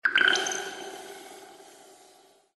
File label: shield touch